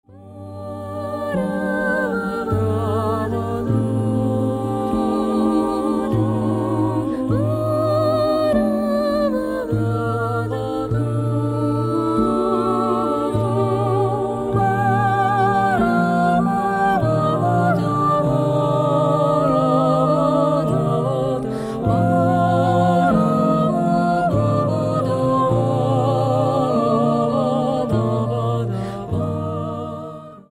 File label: Adagio in G minor